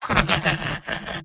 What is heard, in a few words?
engineer laugh evil; tf2; garrysmod; mvm